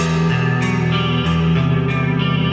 guitar, Sad

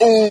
"ooh", voice

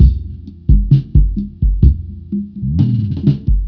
Drum loops; Just Add Drums

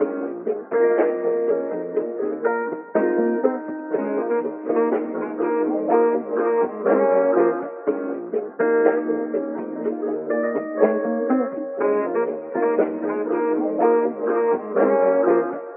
sax and vibes, Loops; Phonky

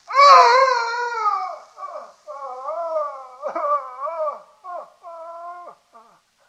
groans; ooh ahh

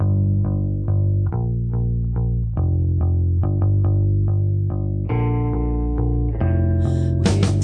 bass, Sad

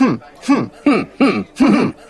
mmm hmm mmm-mm-mmm hmm-mmm LOOP; ooh ahh

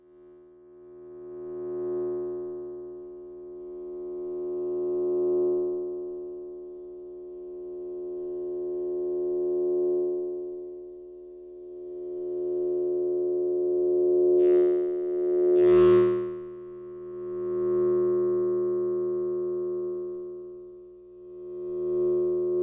frequencies, Rumbles

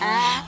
"ah"

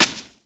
pile, Misc Percussion Ticks

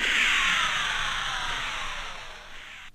downspin, zaps and beeps

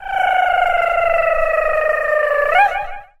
brrrrrr, Voice

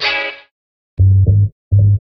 guitar one note; Loops; Phonky

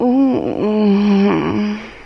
female orgasm; ooh ahh

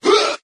huh group of men

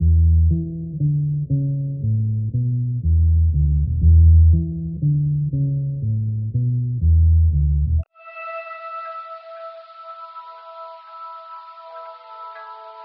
keys galloping, jazz loops

